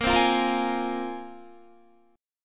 Bb 7th Suspended 2nd Bb down Guitar; Bb7sus2 strummed

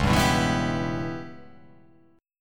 C sharp Minor 7th Flat 5th C sharp

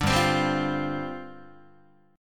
G 7th Suspended 4th G down Guitar

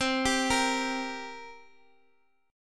G Minor Double Flat 5th, C

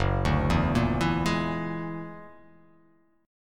F sharp Augmented 9th F sharp, chord

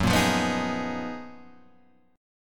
F sharp Major 13th Guitar Half Step Down

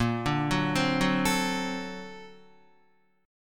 Bb Augmented Major 9th Bb, Guitar; Bb+M9 arpeggiated; JGuitar

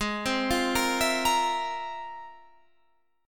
F Minor Major 11th

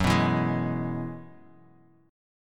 Gb 9th Suspended 4th Gb, Guitar DADGAD; chordshape; down

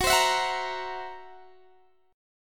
Fsharp 7th Flat 5th down Guitar Standard; strummed; JGuitar

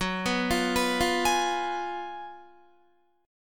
Gb Major 7th Suspended 2nd Suspended 4th, Guitar

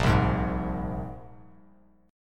A sharp 7th Suspended 2nd, Bass Standard; strummed; JGuitar

